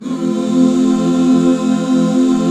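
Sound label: F major, Back Chords; Sound & Vision - Gigapack II CD 1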